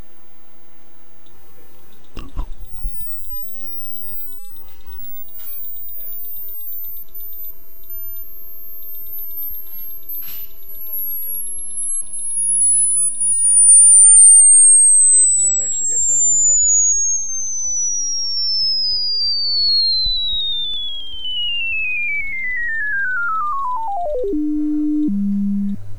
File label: test funct gen scale